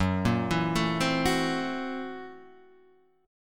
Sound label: F sharp 7th Sharp 9th Flat 5th F sharp down Guitar Standard; arpeggiated; JGuitar